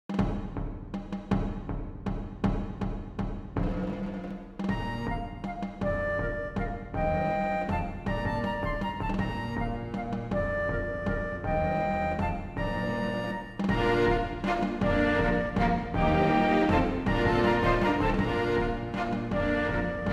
Menuet for fløyter, strykere og trommer; Aamodt

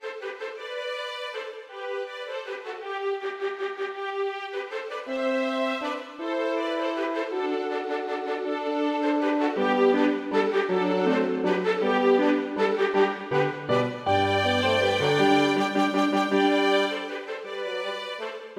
Menue for to fioliner, horn og piano

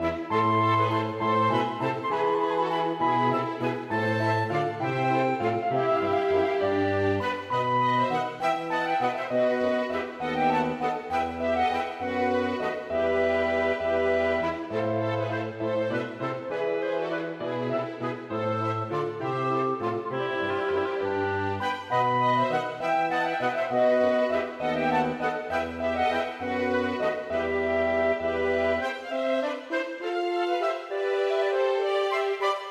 Gigue for fløyte og lite ensemble; Aamodt

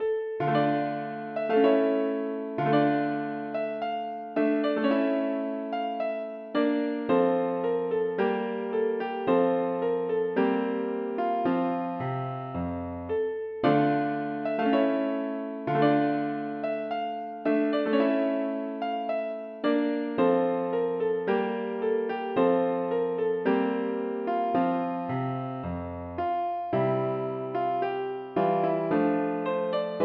Galiard for piano; Aamodt

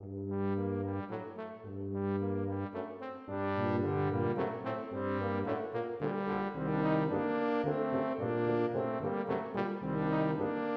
Menue for tubakvartett; Bang

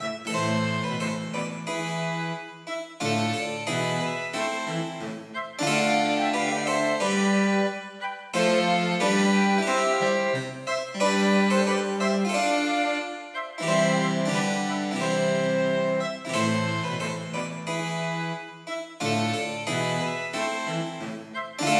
Air for fløyte, fiolin, cello og cembalo

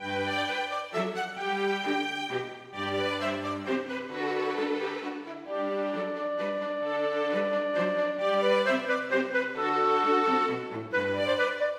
Menuet - Kvartett for klarinett og strykere; klarinett, fiolin 1, fiolin 2 eller bratsj og cello

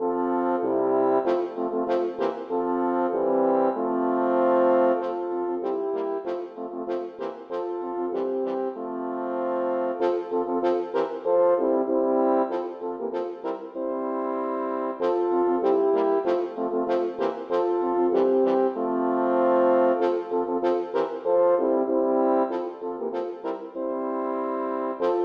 For fire horn

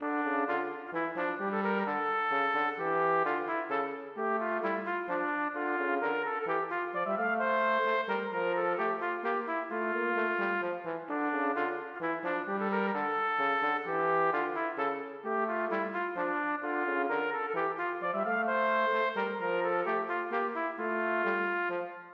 Menue for trompet og bariton; Bang

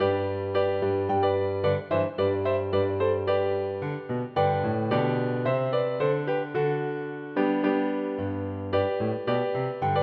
Intro for piano; Bang

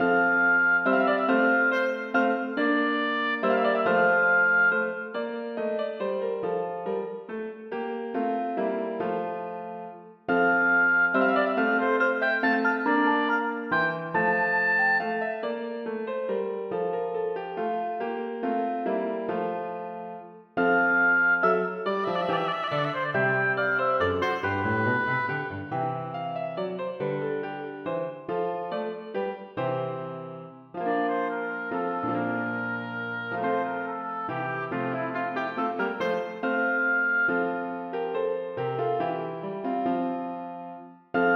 Menuet for obo og piano; Aamodt

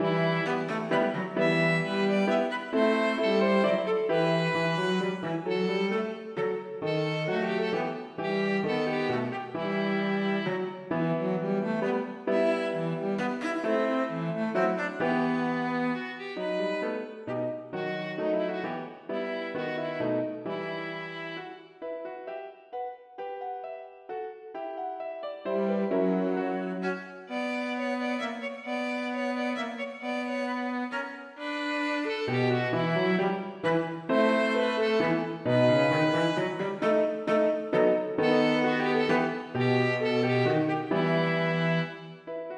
Menuet for klavertrio

